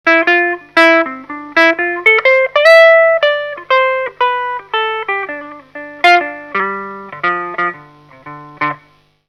Jazz, Guitar Effects Pedals; Pro Co

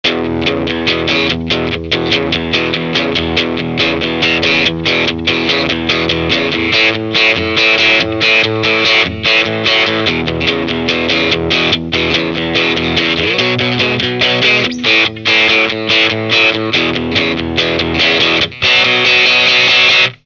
Rat2, Guitar Effects Pedals; Pro Co

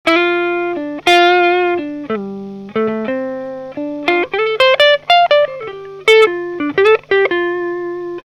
Rat2 Clean Blues, Guitar Effects Pedals; Pro Co

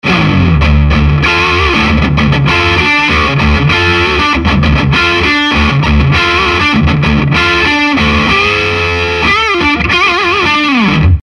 Neck distortion, electric guitar; Greco 1979 LP Standard Cherry Sunburst

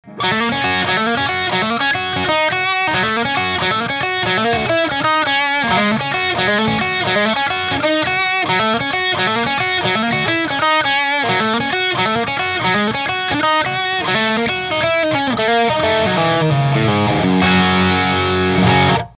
Solo TS7 TS9, Ibanez; Guitar Effects Pedals

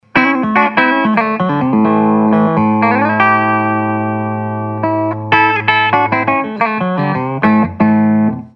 Bridge clean, Greco LP Standard; Greco 1979 LP Standard Cherry Sunburst

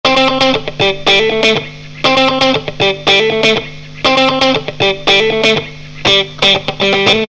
Funky, Guitar Effects Pedals; Pro Co

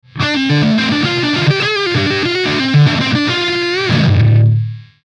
JH3 od OD, Marshall Guitar Effects Pedals

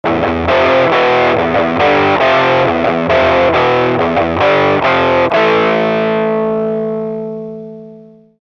Riffs, Guitar Effects Pedals; Pro Co

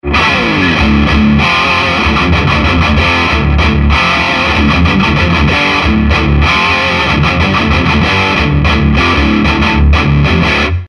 Bridge distortion, Greco LP Standard; Greco 1979 LP Standard Cherry Sunburst

